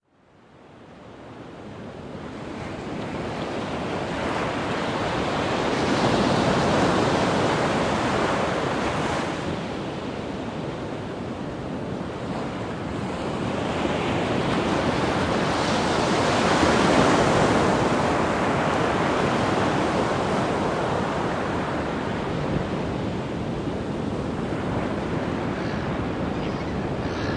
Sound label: Ocean Sound Nature Sounds